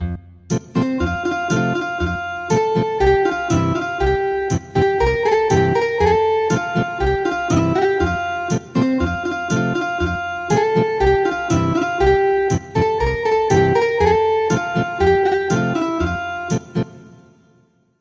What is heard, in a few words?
Ethnic Guitar; Morning tide